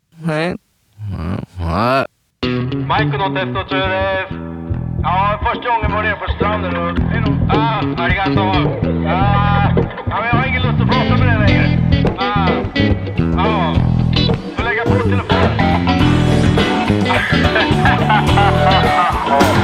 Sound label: Soundcheck